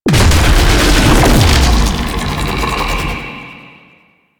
Octopede Phase Two Entry, Sounds Enemies; Warframe; Man In The Wall

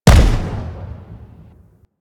Rifle Spaceman Explosion; Warframe; Venus; Venus Vip Rifle Spaceman Agent

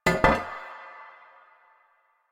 Stone Cafe Table Impact; Warframe; NineteenNinetyNine

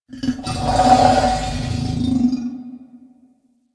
Wolf Ambient Call; Animals; DE; Warframe; Quests; Duviri

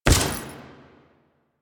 Step Default, Footsteps

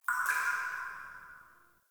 Root Cave Magic Drip G; Ambience; One Offs; Neathuns Root Cave

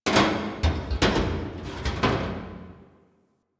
Destruction Metal Scrapes; Ambience; Warframe; Corpus Railjack

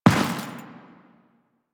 Step Dirt, Footsteps; Warframe; Teshin

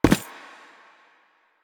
Butcher Step Default, Footsteps; Enemies; Soldiers; Foley; Warframe; Lasrian

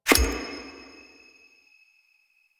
Animal Marker Selected, UI; Warframe; Conservation

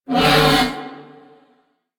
Ram Pain; Animals; DE; Warframe; Quests; Duviri

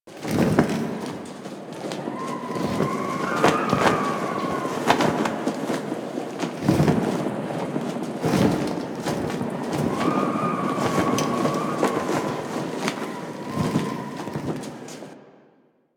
Interior Silo Bed Loop, Ambience; Warframe; Eidolon Plains; Eidolon Landscape; Grineer

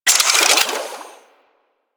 Water Sprayer Alt Pump Load; Warframe

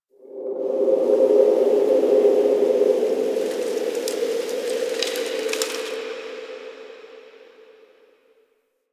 Park Wind; Warframe; Quests; TheNewWar; Ambience; Zariman

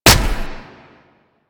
Inf Punch Impact Flesh, Infested; Warframe; Weapons; Inf Kick Punch

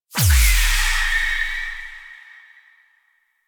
Mega Beam Loop Start; Warframe; Wisp

